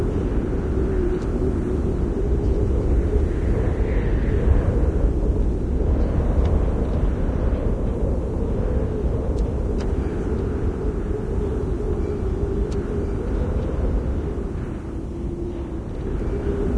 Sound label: urban rooftop ambience loop; ambient